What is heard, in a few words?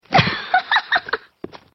Giggle; Marceline